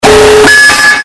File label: nuke